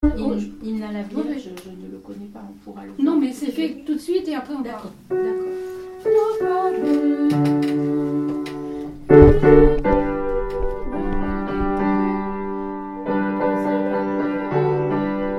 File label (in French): hymnne A la Vierge Soprano